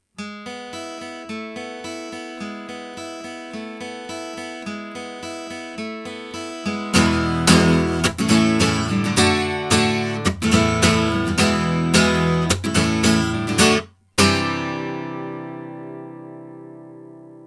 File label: C5000 guitar Gibson; JJLabs